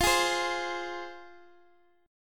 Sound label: F Minor, down, Guitar, Open G